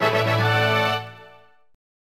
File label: Player Capture